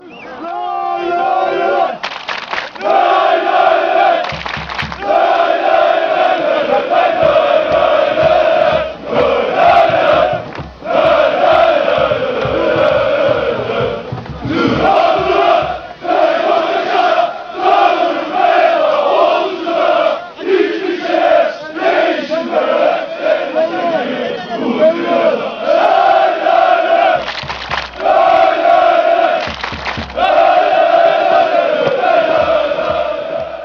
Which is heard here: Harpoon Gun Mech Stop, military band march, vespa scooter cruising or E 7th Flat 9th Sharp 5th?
military band march